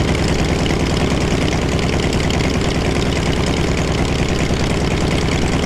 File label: hellcat rear, engines; War Thunder